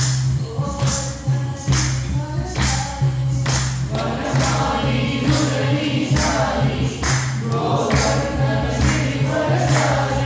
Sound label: bhajan; panchakarma; tiedonpuu